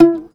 SHUR NOTE, TOPSHUR NT; Soundscan vol.60; Asian Traditions; AKAI

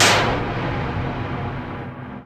tin hit

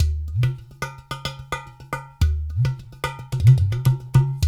UDU, Udu Bata; Spectrasonics; Supreme Beats

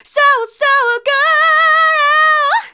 Female vocals and robo-vox

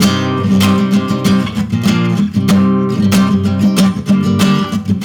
Guitars A, 95bpm, analogue circuit; musicradar

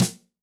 Deep & Dry, Yamaha DTXpress-II snare

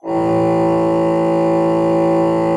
A1, Cathedral Organ, HOLZPRINZ.; Propeller Island

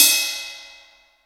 RRIDE C

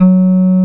OB8 BLOW F#2, OBERHEIM OB8; Big Fish Audio; First Call Vintage Keyboards

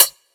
Chh, Drum Hits; Zero-G Trance Inducer; 90 s Sample CDs

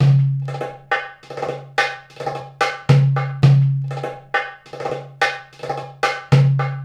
PERC LP, Arabian Traditions

